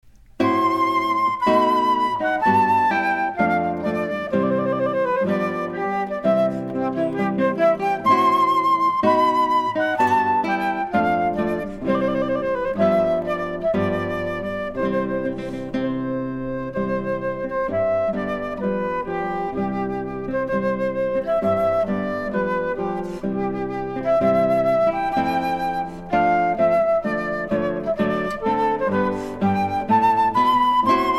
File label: Wedding March